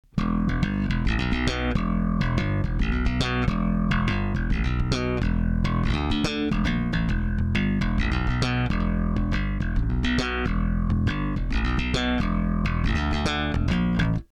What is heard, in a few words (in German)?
Slap mit pump compression